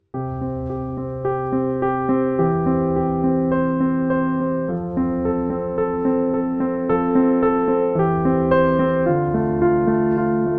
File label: Piano Solo